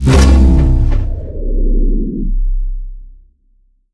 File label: Impulse Gun; We Are the Dwarves